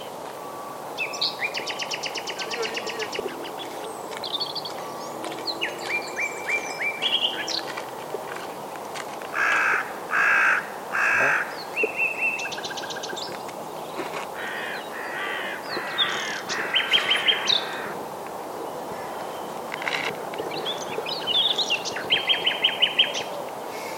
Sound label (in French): rossignol; coubron